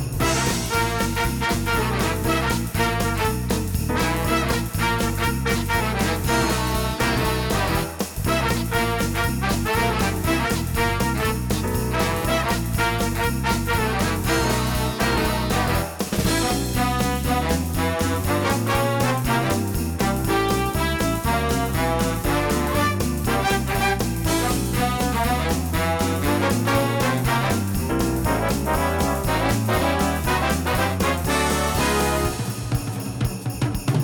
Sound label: monster loop mixdown quieter; monsters university